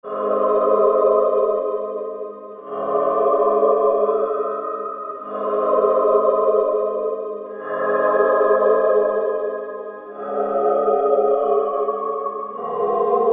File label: PolarChoir, Korg MSC-2S Synth; Synth 1